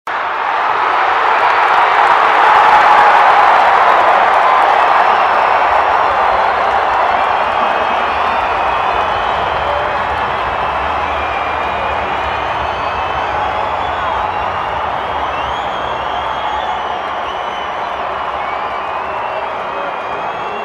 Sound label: Large Stadium; stephan schutze; Texas